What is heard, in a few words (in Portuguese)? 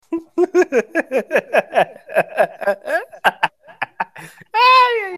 risada